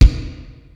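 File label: Kick, Yamaha SY22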